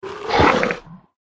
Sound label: skolopendra wound